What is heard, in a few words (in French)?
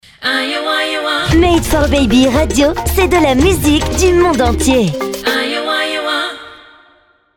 MUSIQUE DU MONDE